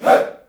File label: "HEY", Heart of Africa, shout; Spectrasonics; Heart of Africa 1; CH. SHOUT1